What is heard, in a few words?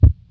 kit, Yamaha Motif-ES8